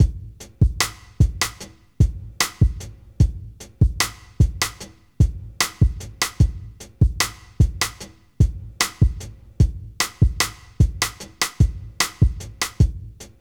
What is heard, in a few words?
hip hop; INIS; Funky Xtreams